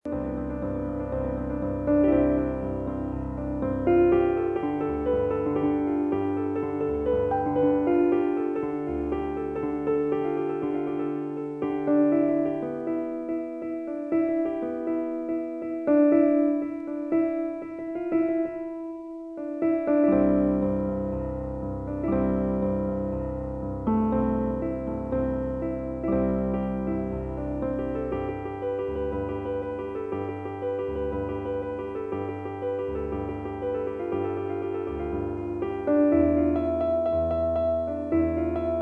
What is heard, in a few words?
Piano